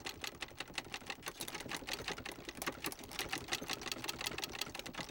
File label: Pinky ass Rattle NO servo, monsters vocalizations; DoomRTX